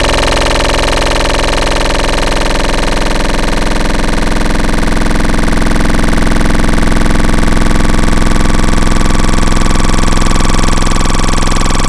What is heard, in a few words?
Bubble Trouble, Wavetables; Cymatics; Wavetables Collection Vol 3; Serum